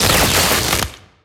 pride zap

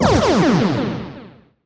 mega mush end

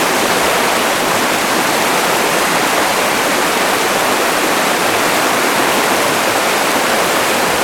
water flow large

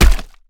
head hit; finalmother; isaacs

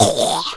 fly cough

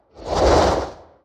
brain move

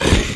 monster grunt

